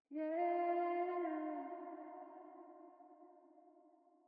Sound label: EXOTIC VOCALS Fm